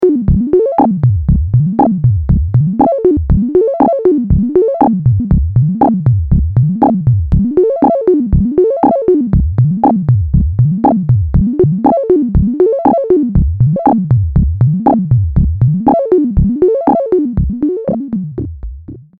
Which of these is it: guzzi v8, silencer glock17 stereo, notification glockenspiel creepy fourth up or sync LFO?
sync LFO